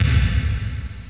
reverb bass

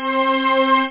mix string, strings; OctaMED SoundStudio V1

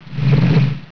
Popup Block; pyramid

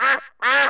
duck

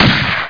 u gun; Pamela Anderson Interactive

